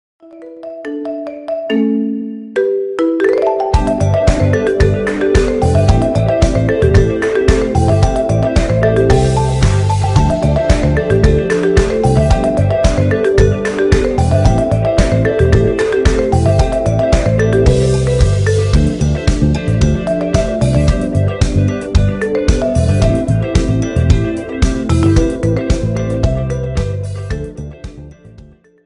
marimba ringtone remix

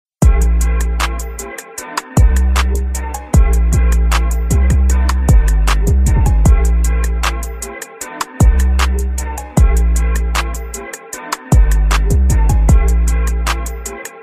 instrumental ringtone

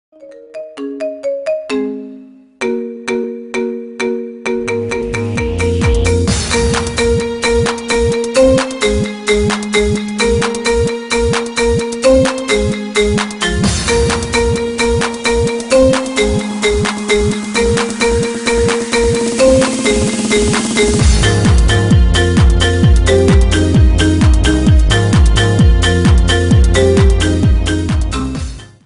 marimba ringtone remix

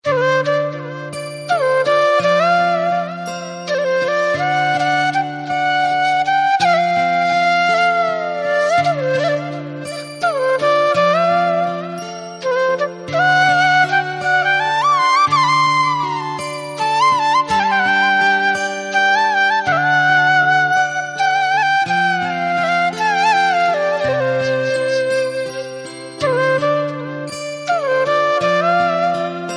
flute ringtone

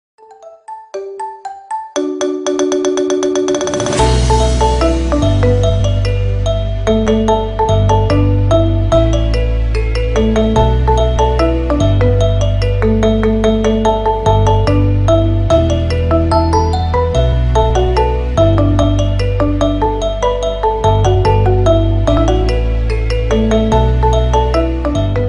marimba ringtone remix

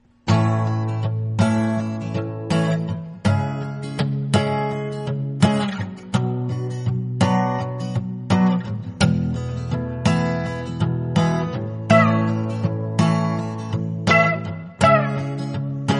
romantic

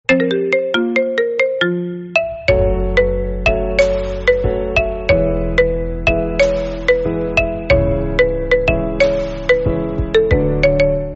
marimba

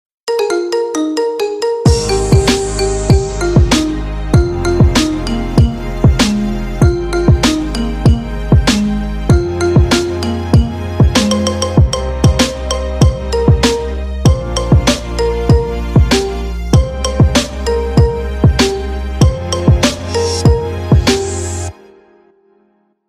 marimba remix ringtone

